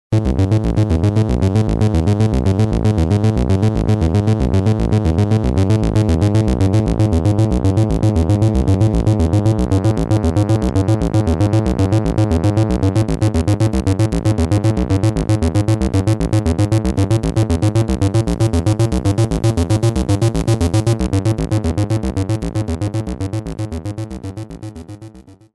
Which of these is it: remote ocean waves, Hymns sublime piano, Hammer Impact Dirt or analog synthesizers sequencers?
analog synthesizers sequencers